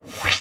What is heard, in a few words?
rift Start; DimDoors